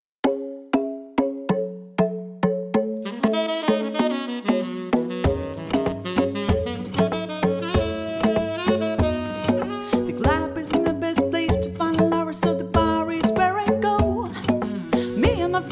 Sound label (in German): Partyband 4er Piano Drums Gesang Band; Hochzeit